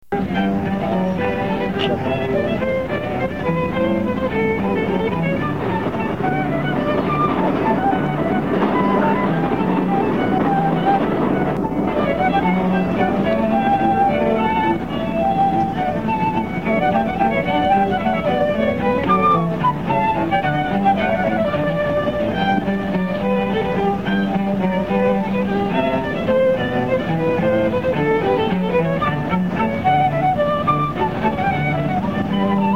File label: Renaissance fair music